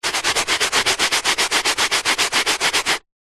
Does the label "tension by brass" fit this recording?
No